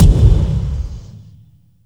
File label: GORILLE BD; USB Soundscan vol.33; Disjointed Textures; AKAI